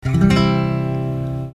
Der C-Dur-Akkord, C-Dur c-e-g; Harmonielehre

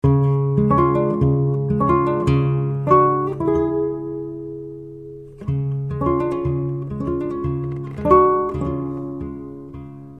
Unvollständige Kadenz; Harmonielehre

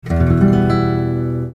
Der e-moll-Akkord, e-moll e-g-h; Grundakkord (Tonika); Kadenz in der Tonart e-moll

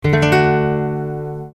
Der D-Dur-Akkord, D-Dur d-fis-a

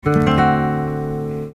Der Septimen-Akkord D7, D7-Dur d-fis-a-c'; Dominant-Septakkord; Harmonielehre

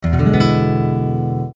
Der Septimen-Akkord E7, E7-Dur e-gis-h'-d'; Dominant-Septakkord; Harmonielehre

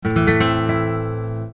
Der a-moll-Akkord, a-moll a-c-e

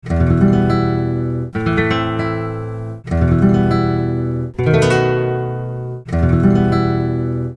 Eine vollständige Kadenz in e-moll; Harmonielehre

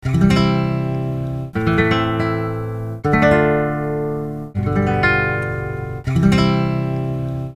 Eine erweiterte Kadenz in C-Dur; Harmonielehre

